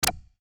futuristic button

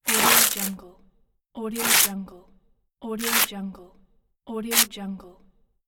tearing paper

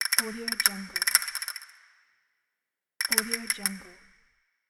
the castanets

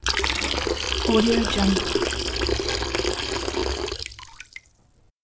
filling bucket with water

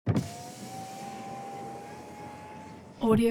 transportation sports car int window open

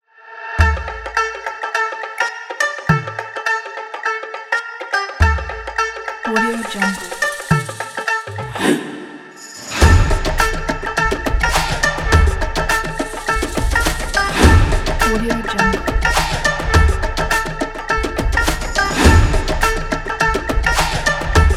indian bollywood punjabi

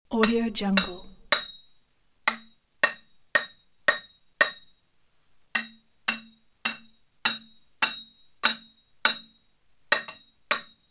dumb metal pipes hammering

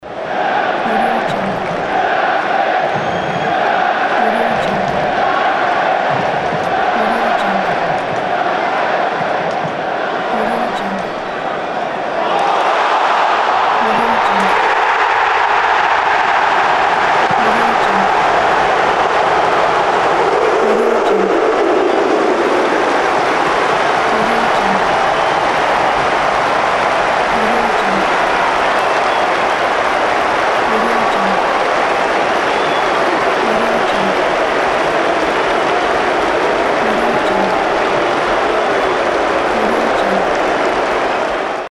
soccer goal

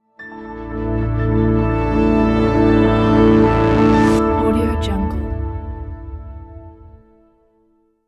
animation logo reveal

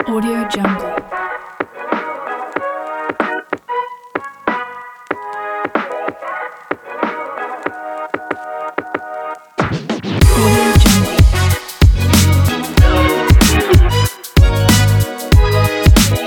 hiphop beat

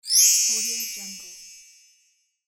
diamond sparkle

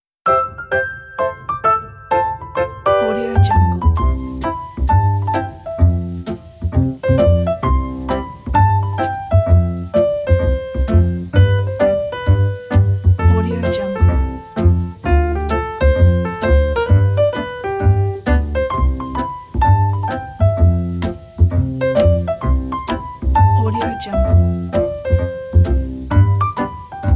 funny kids; olimusiccompany

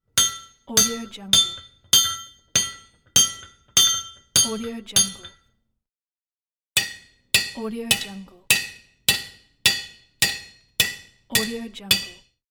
hammering metal

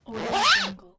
zipper

